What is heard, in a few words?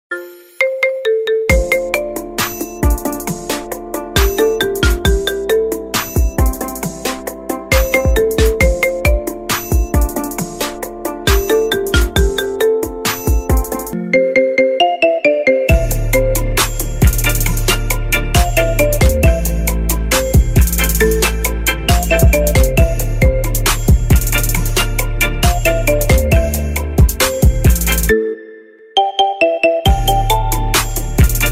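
Marimba Remix, ringtone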